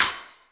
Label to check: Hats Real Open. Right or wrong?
Right